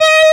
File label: Left-hand vibrato; osu